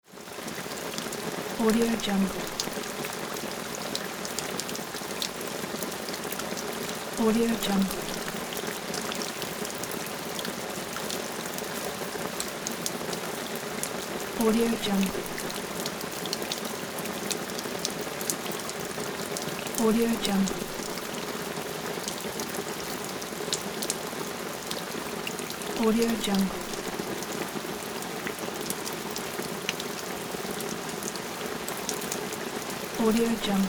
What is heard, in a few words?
rain on canopy tent